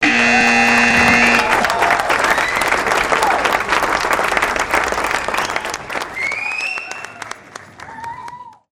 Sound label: buzzer